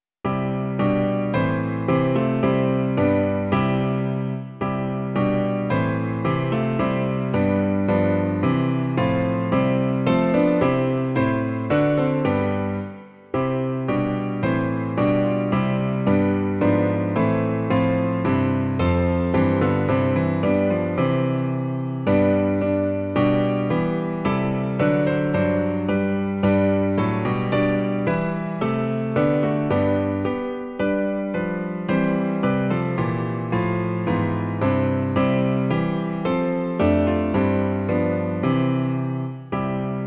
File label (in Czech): klavír; Klavírní verze